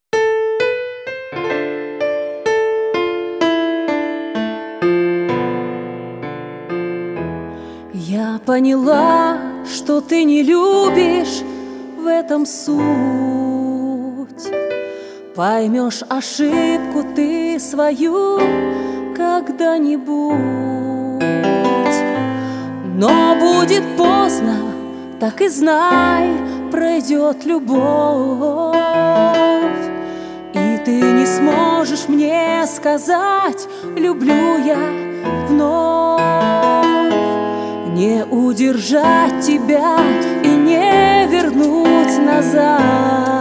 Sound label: SAX